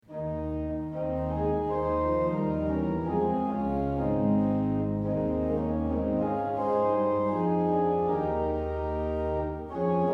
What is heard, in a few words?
hymn